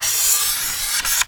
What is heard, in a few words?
Polystyrene Squeak